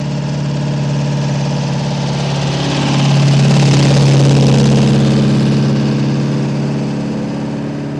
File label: Car By Slow